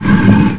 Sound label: Generic Stone Grind; Playable Tutorial Level